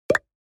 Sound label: Funny pop up